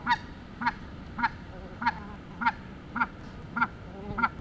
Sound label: Anser caerulescens; Snow goose; The loud gaggle